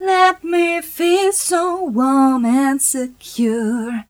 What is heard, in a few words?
f mel g, fem Vocals